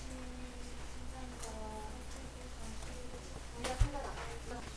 bagpipe

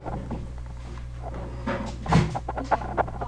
cry

